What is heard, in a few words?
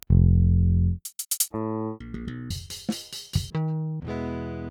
Pultec; Virtual Analog